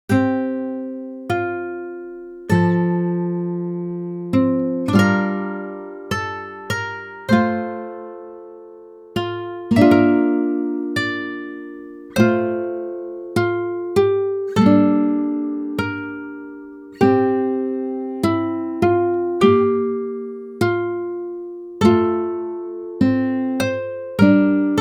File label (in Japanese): guitar; アコースティックギター音色でクラシカルな雰囲気を表現。